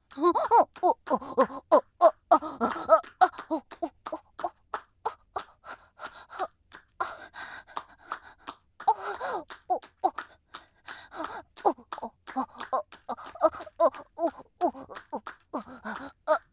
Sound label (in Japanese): オホ声; SEあり